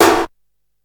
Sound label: old school ambient steel snare drum f sharp key